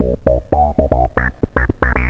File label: Fat Bass; Deader